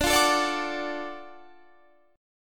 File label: Gb Minor Major 7th Sharp 5th D, guitar